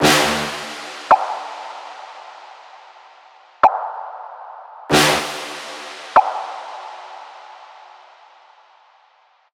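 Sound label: Synth Impact, 95, D minor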